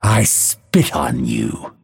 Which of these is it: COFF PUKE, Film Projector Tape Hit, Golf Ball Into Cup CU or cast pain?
cast pain